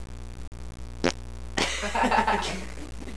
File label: popgun